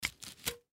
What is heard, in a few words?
Surgical Tool Set, Surgical Tool Set Down Acrylic Paper; Paper Towel Surface Surgeon Surgery; Hospital; Emergency